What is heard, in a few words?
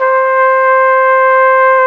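BRS, Brass Trumpet Solo; Roland L-CD702; Tpt 1-4 Solo; Tp 1 Class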